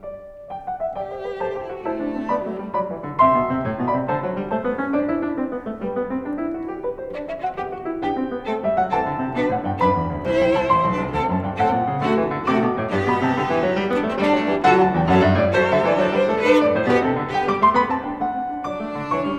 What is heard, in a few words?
in G Major, Cello; 10 Variations on Ich bin der Schneider Kakadu; Op. 121a Variation IV